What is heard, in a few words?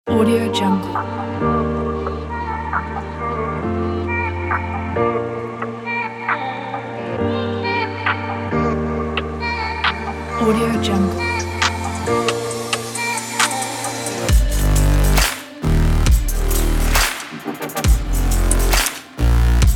slow motion future bass kit